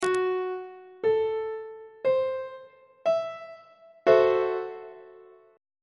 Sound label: a minor 6th 3rd inversion; on treble clef